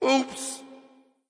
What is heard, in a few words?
oops